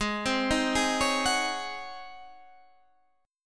C sharp Major 7th Suspended 2nd Suspended 4th G sharp